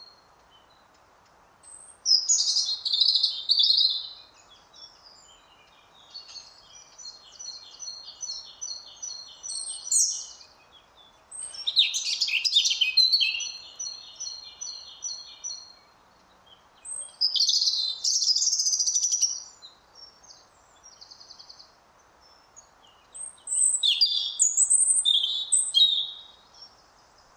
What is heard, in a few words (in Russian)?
zaryanka erithacus rubecula, zvuki peniya ptits